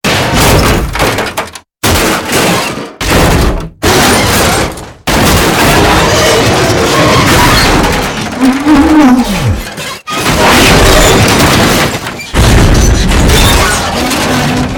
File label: Car Crash Elements Mix